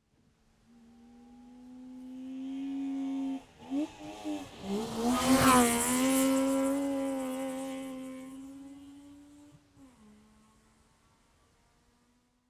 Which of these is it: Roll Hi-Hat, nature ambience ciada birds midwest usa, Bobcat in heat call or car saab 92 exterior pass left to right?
car saab 92 exterior pass left to right